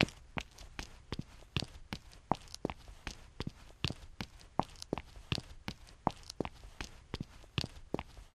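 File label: Footsteps Female Run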